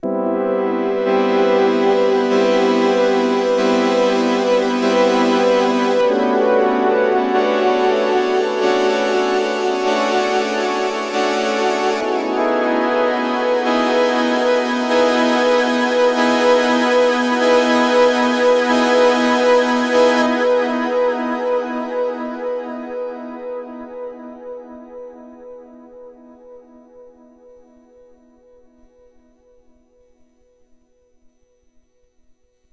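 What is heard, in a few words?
MOD Devices MOD DUO X J6 Delay Reverb Tremolo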